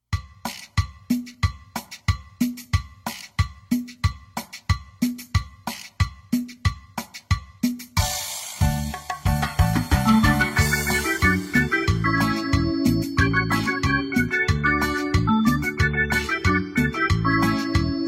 Tropical

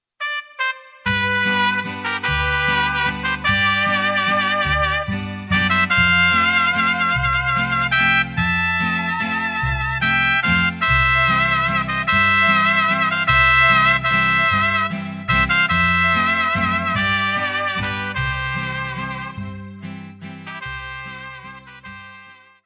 Manianitas, mariachi

